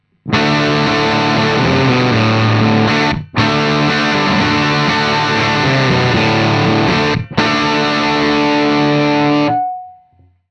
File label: plexi 45, line6 podx3pro